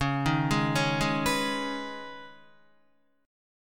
Db 7th Suspended 2nd Db, down, Guitar; JGuitar

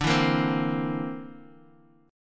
D 7th Suspended 2nd Sharp 5th D, Bass Standard; D7sus2#5 strummed; JGuitar

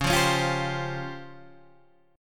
C sharp Major 7th Flat 5th Guitar Half Step Down; C#M7b5

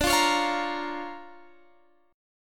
Bb Minor, D; strummed

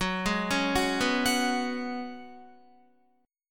Gb Suspended 2nd Suspended 4th, Guitar; arpeggiated

